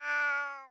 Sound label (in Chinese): 豹猫待机声